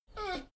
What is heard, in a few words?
dogo argentino whine short; natur-tiere; hunde